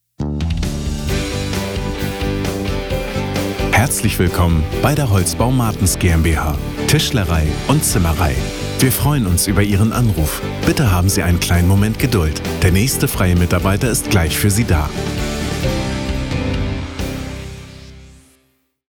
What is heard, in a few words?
Cheer Up